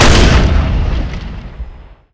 cannon fire, vehicles speeder; Quake 4 Alpha; id software

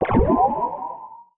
grapple; Quake 4 Alpha; weapons grapple

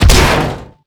Pinky Bodyfall; DoomRTX

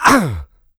pain large, player; medium

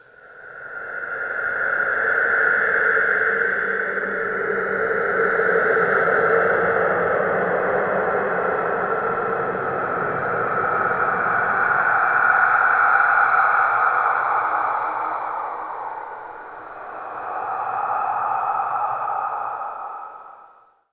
Breath airy; DoomRTX; ID LOGO mono

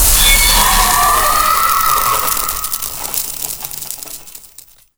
freeze; Quake 4 Alpha; strogg; iron maiden

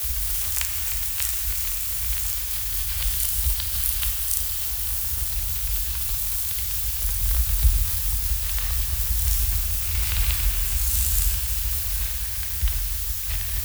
cryo loop; Quake 4 Alpha

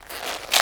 tentacles in; Doom

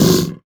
imp chatter combat, monsters; DoomRTX; imp5

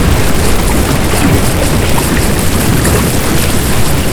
goo burn, weapons goobgun; Quake 4 Alpha; id software

